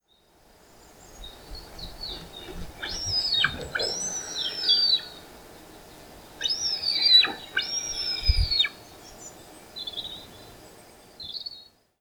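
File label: Lybiidae, bird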